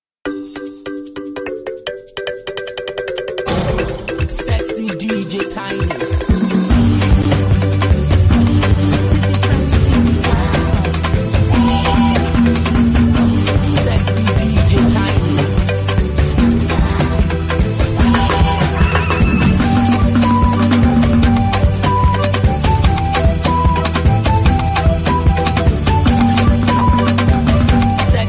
Afro Sunset (Beat)

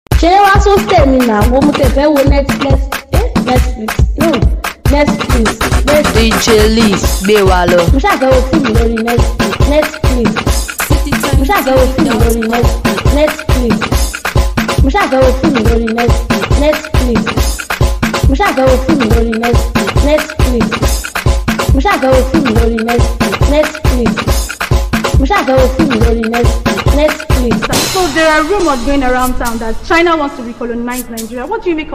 Cruise Beat

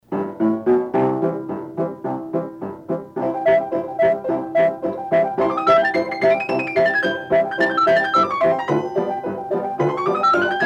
Sound On Sound Piano Pieces

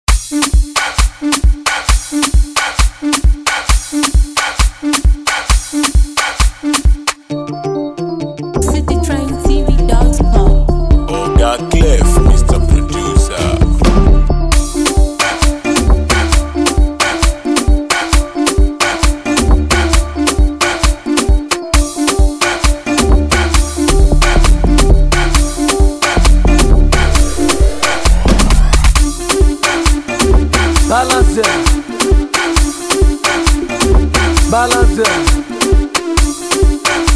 Beat

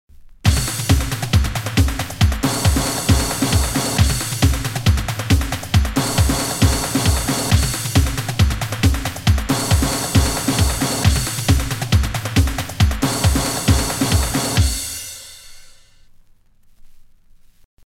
Bonus Beat

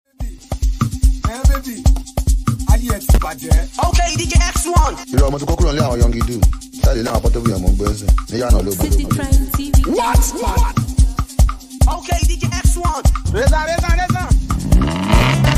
Riddim Amapiano (Speed Up)

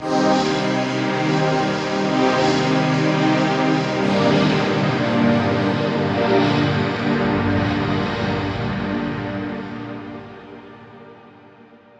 Myth Pad

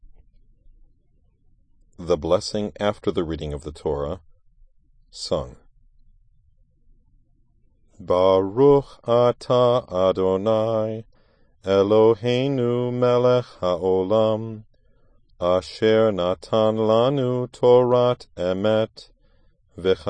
torah after sung

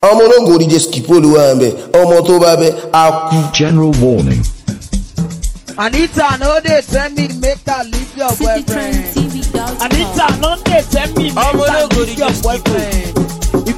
Amapiano

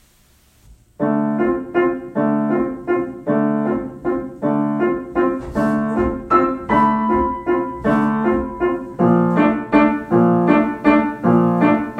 Waltz

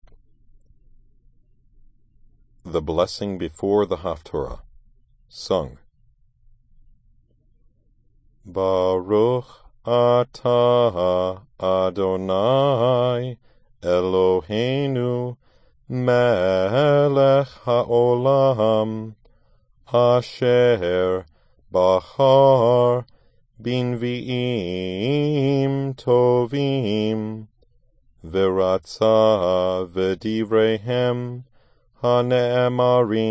Haftarah before sung

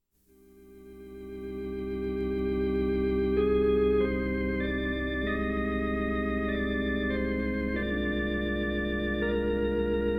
Funeral Organ

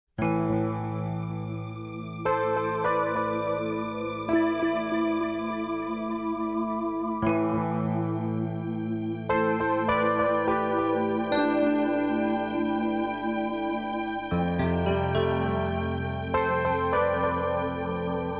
Instrumental

